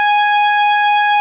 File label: CS PWM; INIS; Vintage